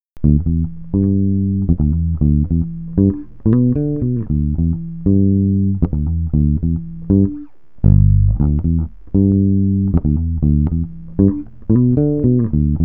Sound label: Acoustic Groove in E